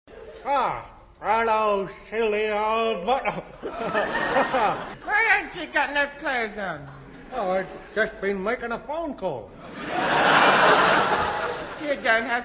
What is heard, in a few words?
phone; eccles; goons